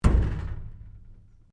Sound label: Strike Metal Tank Exterior Rattle Soft; Material components to create more complex sounds